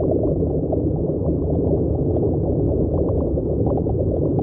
underwater loop; Nature-Weather

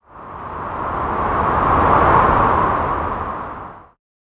car pass; Transport

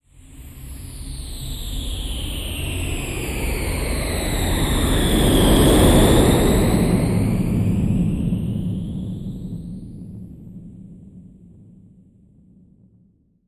airplane takeoff; Transport

